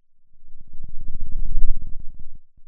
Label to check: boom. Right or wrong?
Wrong